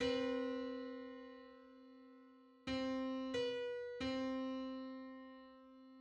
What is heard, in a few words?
Hundred-nineteenth harmonic on C